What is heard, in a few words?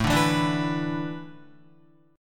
A Suspended 2nd Suspended 4th, Guitar; Asus2sus4; strummed